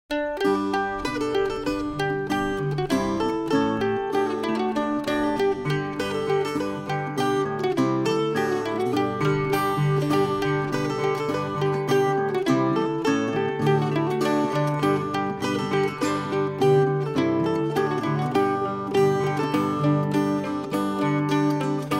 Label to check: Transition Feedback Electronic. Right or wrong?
Wrong